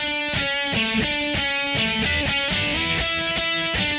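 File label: lead distorted guitar riff; GUITAR LOOPS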